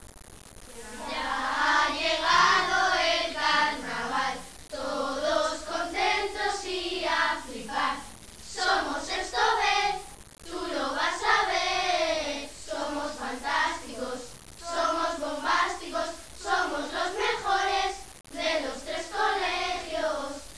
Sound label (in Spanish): murga